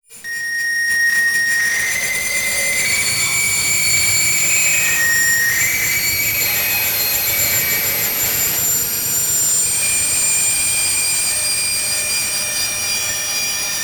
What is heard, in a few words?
Screech Digital Noise; Adobe; Production Element; Imaging